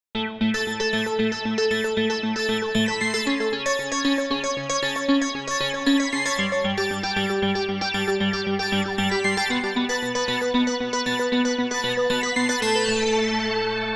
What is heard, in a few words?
Bright Synth